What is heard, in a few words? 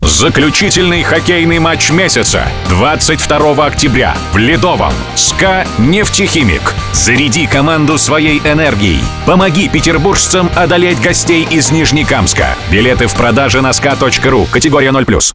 SKA